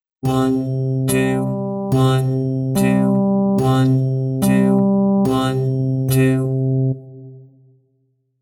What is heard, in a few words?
example of duple meter